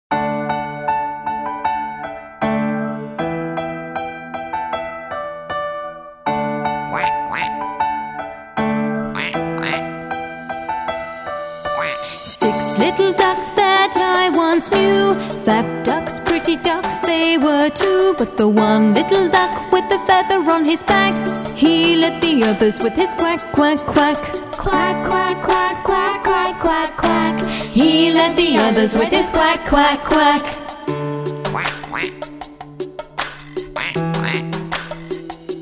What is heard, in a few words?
Six Little Ducks